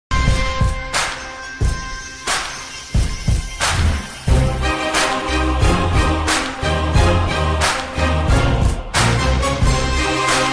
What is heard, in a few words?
Theme Music Ringtone; Mankatha